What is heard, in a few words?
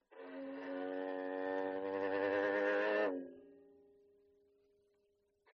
violin long bows dynamic; aston stealth